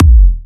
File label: Big Kick